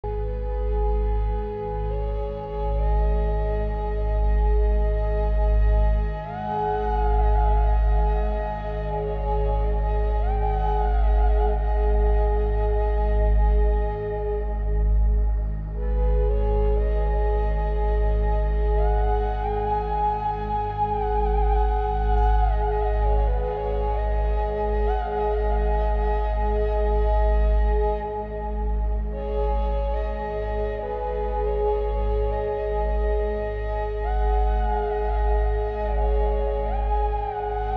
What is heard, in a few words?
Origins Flute